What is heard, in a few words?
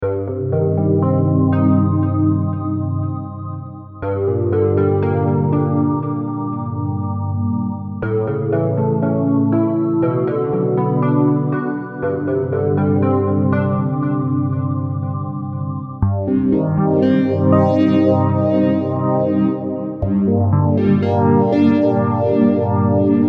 Chords